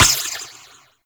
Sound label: Ricochet; Warframe; Cowgirl